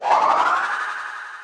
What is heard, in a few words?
cookie projectile; weapons; dota; snapfire